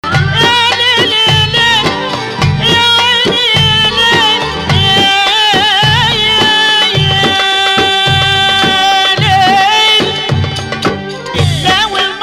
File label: Rast Ya Maal Jiharkah Octave